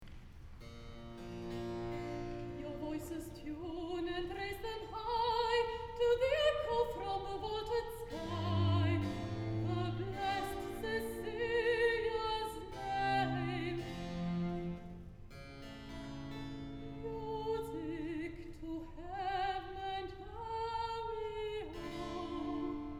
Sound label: Recitative; Your voices tune